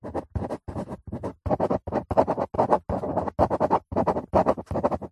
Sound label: office handwriting pen on paper on cardboard box fast; zapsplat